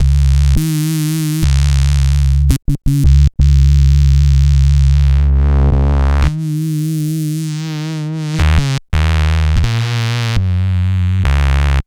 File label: Mud Bass, Drum n Bass, Bass Loops; KJ Sawka; Drum n Bass & Beyond